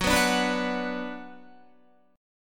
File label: F sharp Suspended 4th Sharp 5th, Guitar; F#sus4#5; strummed; 13,14,14,16,14,14; 4F,4A,5C,5G,5C,6E